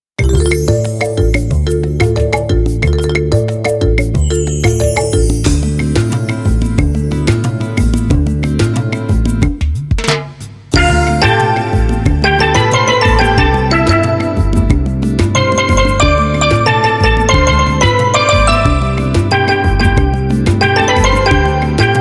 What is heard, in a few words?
Calypso; Aries